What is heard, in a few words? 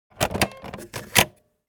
Radio cassette in